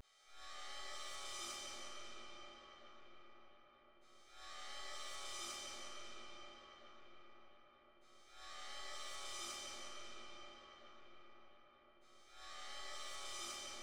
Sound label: ride; hydrogen